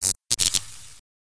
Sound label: fizzle effects